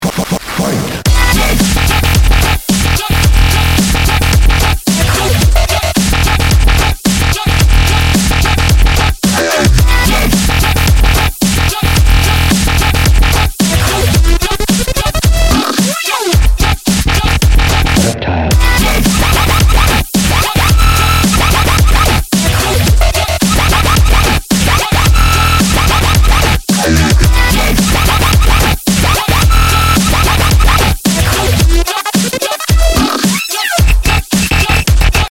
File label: reptiles dubstep